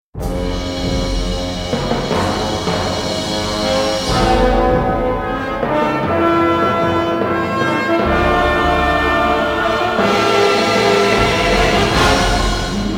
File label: music cut; Jack Keane 2 The Fire Within; Windows gamerip 2012